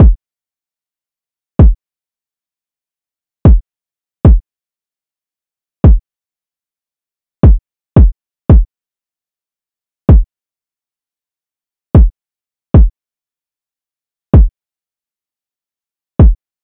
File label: Drum Loop - 113 BPM Kick; Loop Stems; Cymatics; Bermuda Pt 2 Drum Loop